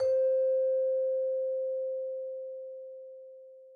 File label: Scale; Second Scale3; tintin reporter cigars of the pharaoh